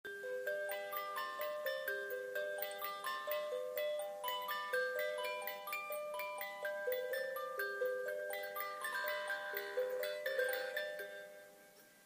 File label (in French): Boîte musicale a la claire fontaine